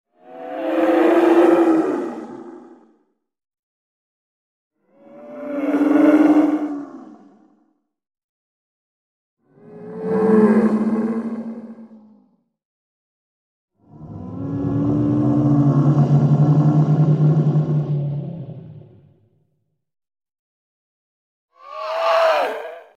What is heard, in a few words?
Animal stinger - Lowered and processed bull roar by x5; Hollywood Edge Sound Designers Tool Kit